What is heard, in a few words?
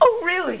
powersaw